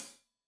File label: Hat Loose, Hi-Hat, Cymbals Stereo